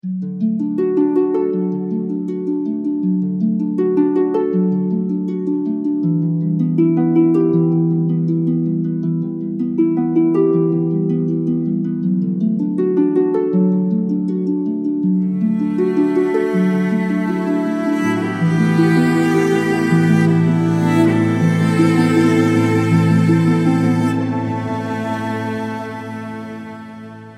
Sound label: poignant reflection score